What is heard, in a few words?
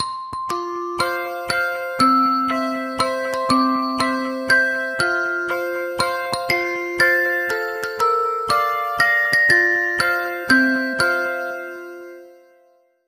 Toy Piano Happy Birthday